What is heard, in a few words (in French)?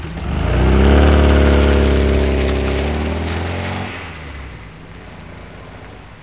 Départ de la MGB Weber